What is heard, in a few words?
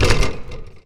Basketball Rim